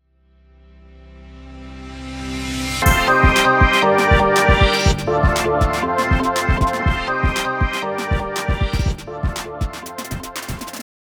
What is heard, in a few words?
intro music